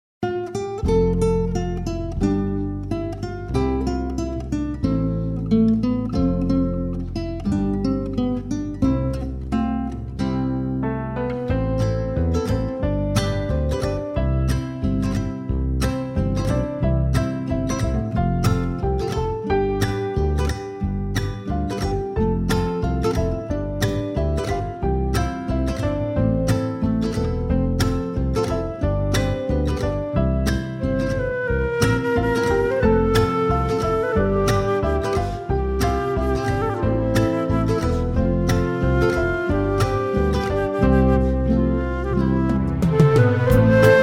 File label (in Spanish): Pista con melodia instrumental